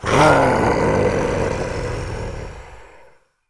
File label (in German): Monster